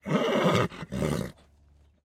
horse pair vehicle turnoff; teardown; ps5 windows xbox series xs

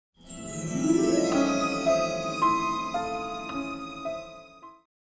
Exploration - Demon Cave; Heroes of Might and Magic II

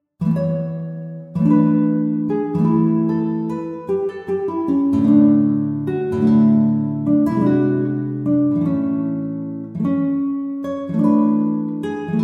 Bard Draft

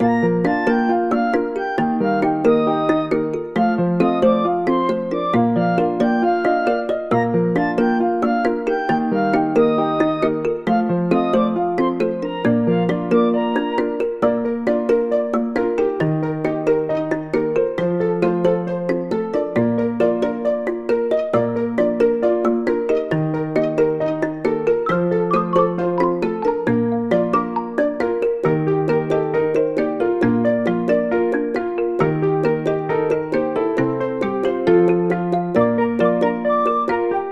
The Outback Upbeat; peppa pig world adventures

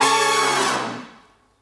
Flashover

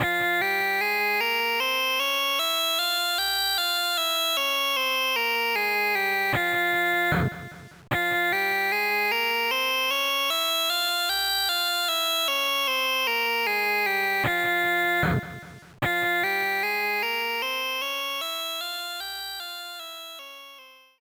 Guitar; Guitar Study Creativity 4

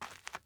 dirt step; teardown; ps5 windows xbox series xs

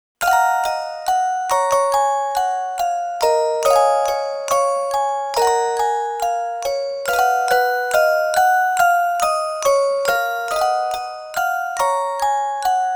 Music Box; F Fanatic Sound Collection

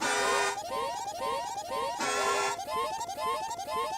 Long Hallway C - Human

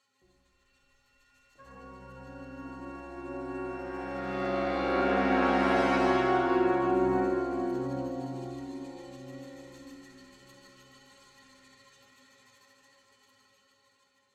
Spooky Ship Mood

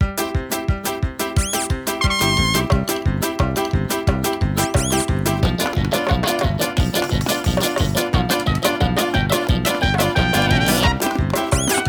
Music Menu; Monkey Mischief Party Time; Wii

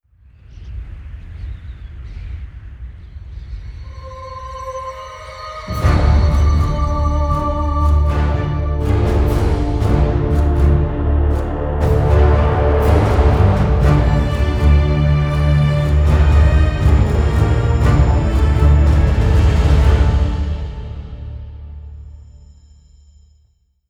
Round Start; Zombie Der Riese

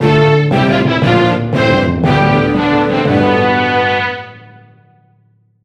Jingle Fantasy; ashes of the night

